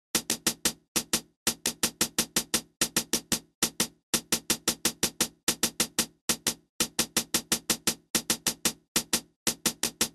beat

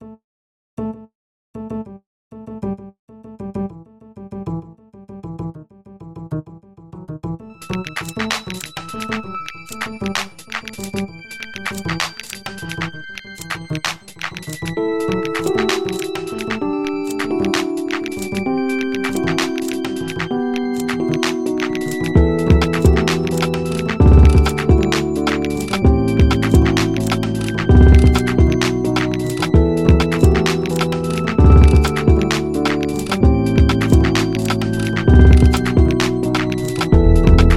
beat

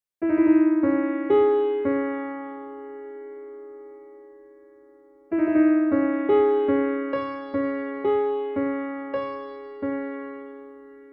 trip hop

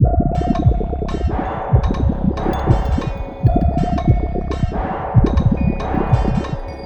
underwater step

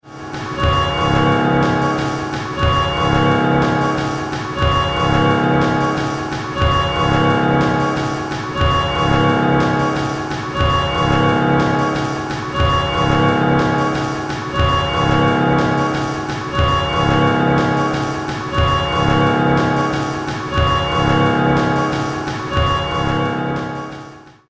live loop piano

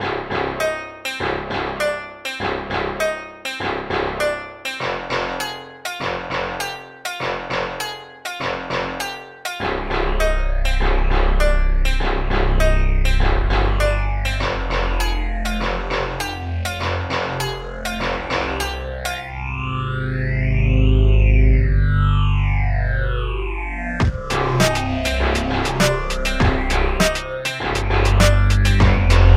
piano

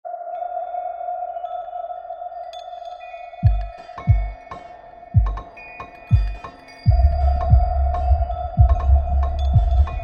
underwater step

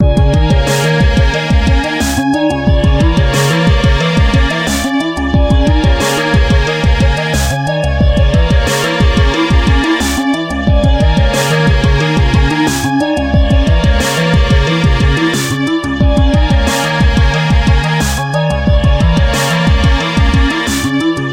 freaky beat

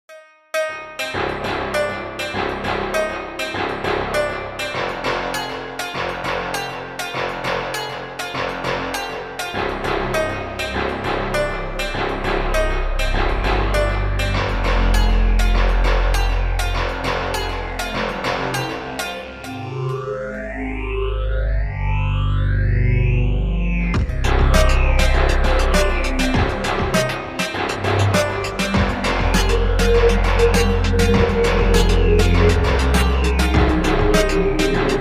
piano delay

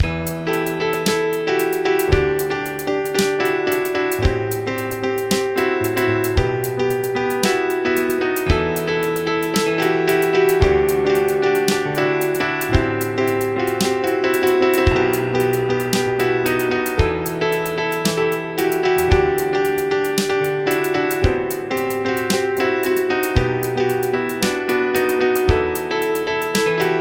random piano

